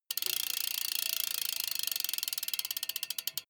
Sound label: bmx bike freewheel push and slow down short